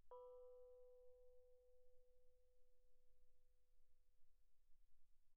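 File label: thai gong B4 pianissimo, Percussion gongs & tamtams; thaigongs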